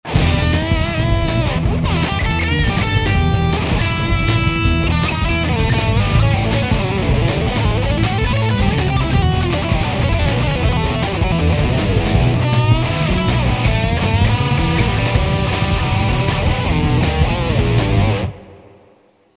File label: MAXON OD820